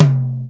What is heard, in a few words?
high floor tom sample c key